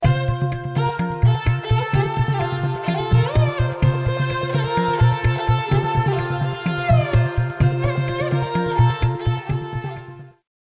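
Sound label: Mosquito Khan, Nokia ringtone; Nokia 1203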